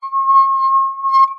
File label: household wine glass finger rub rim tone ring; zapsplat